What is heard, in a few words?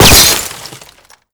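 bullet impact auto glass; Modern Warfare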